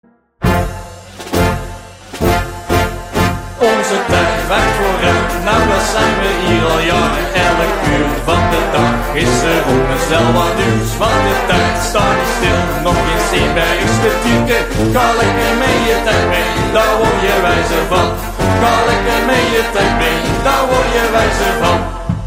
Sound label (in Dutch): Refrein